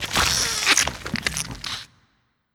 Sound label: Jar